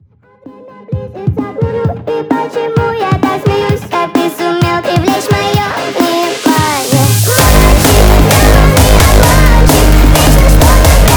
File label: Hardstyle Remix